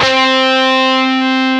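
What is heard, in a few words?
distortion guitar 2 C3; AKAI S-Series CD-ROM Sound Library VOL-8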